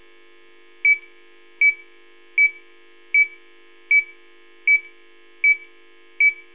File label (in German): Signal Abstand 1m